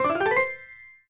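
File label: Bar Increase Piano; Klavir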